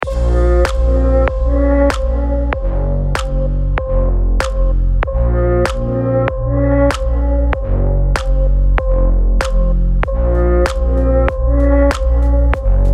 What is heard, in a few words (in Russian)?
muzyka dlya sna mladencev, meditation music